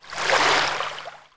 Travel asterisk

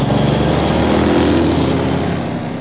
TRUCK; SIMUTRANS

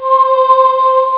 voices, synth

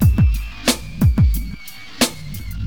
Drums loop, HipHop; LiveAct Pool 1

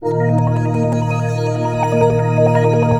SYNTH D+B 160 C, Drum+Bass, Keys; eJay

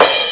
drums crash; Atari Mega Archive

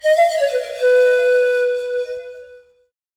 FLUTE ETHNO 125; eJay

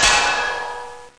clang

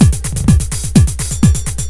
D-Kit

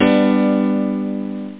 piano, pianos

